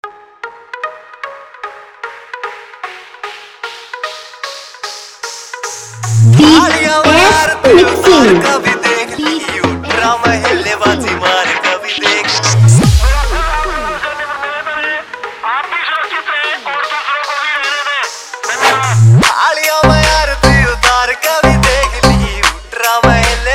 Hard Remix Haryanvi Dj Song

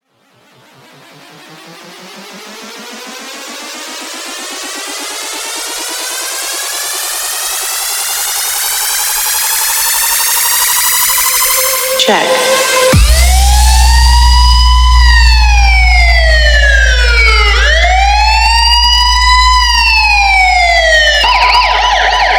Police Siren Sound Testing Dj Song

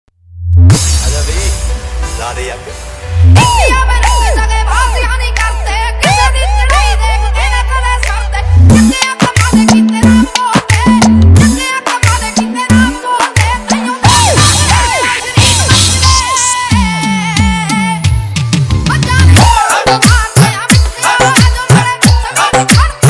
Remix Punjabi Dj Song

